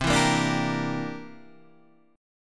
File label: C 9th C down Guitar Open D